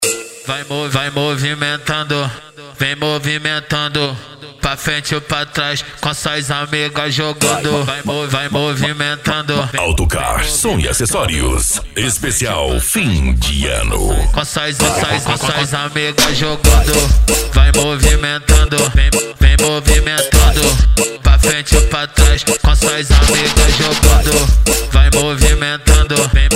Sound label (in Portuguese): funk agressivo ritmado e funkadao